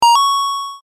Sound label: Game coin collect